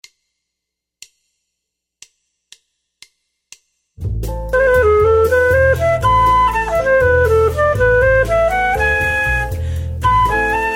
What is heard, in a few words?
minor 4 a